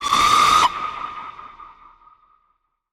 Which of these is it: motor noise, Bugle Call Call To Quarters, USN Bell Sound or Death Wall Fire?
Death Wall Fire